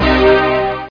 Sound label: lose